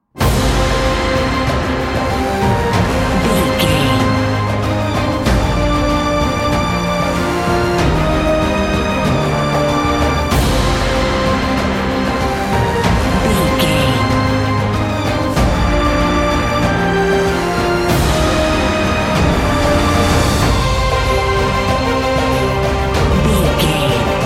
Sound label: epic cinematic